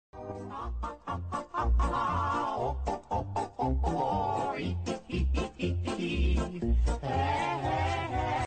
ah ah ah ah ahahah oh oh oh oh ohoho he he he he hehehe hey hey hey